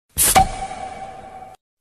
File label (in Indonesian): nada notifikasi simple elegan; suara yang sederhana namun tetap mewah